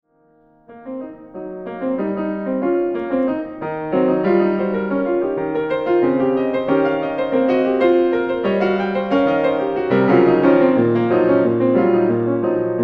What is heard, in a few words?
Five Pieces for Piano E Flat Five Ways